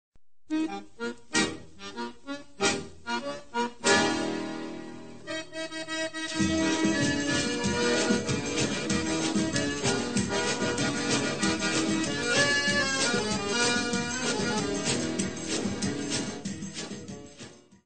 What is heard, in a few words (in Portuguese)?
sanfona; instrumentos